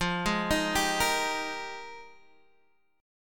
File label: F Minor 11th F down, chord; Fm11; arpeggiated; JGuitar